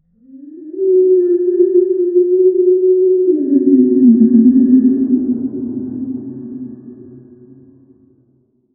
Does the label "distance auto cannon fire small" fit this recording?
No